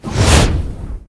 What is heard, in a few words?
fire; CosmicRage; combat; bigbite